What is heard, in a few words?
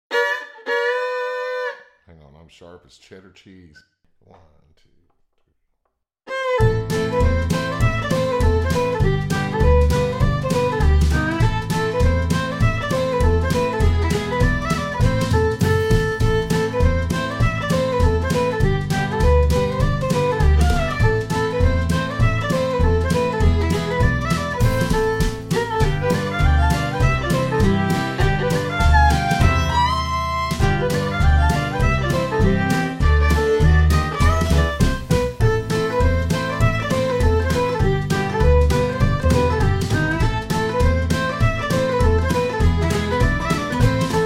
Flat Gap Shuffle, bluegrass fiddle; red room audio; traveler series; kontakt